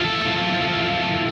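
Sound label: guitar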